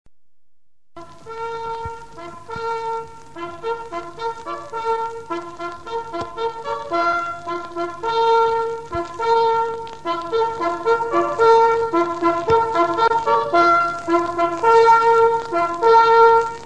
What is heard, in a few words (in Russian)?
pionerskiy gorn podem; сигналов горна и барабана; Демонстрация сигналов подъема; пионерский; Квест-игра «Путешествие по стране Пионерии»